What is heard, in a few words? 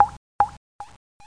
WATER; mordor